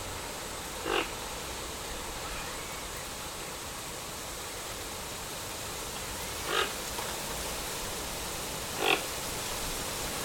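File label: Ramphastos toco, bird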